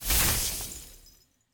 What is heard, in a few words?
win Line Flash; fairyhansel